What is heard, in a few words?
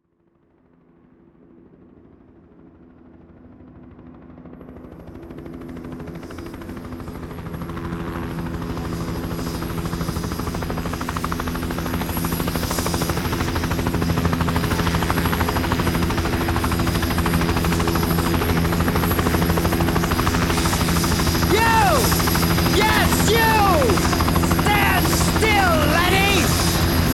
Helicopter